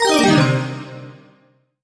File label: Bell Voice Negative; MUSIC EFFECT; startide